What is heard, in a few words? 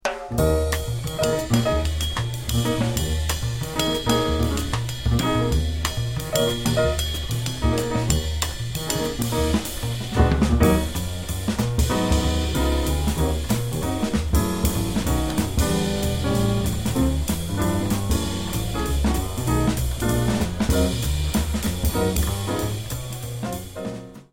minus melody